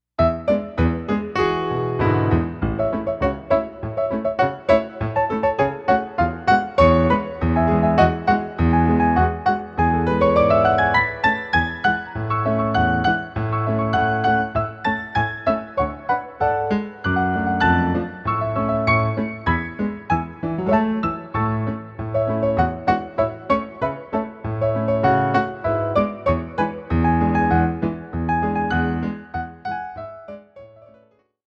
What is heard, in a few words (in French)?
Batterie